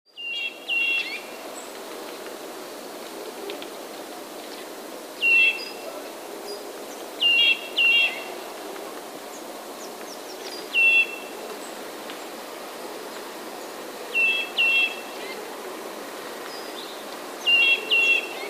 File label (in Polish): kowalik skrzeczy; które kowalik wykonuje nieco skrzeczącym głosem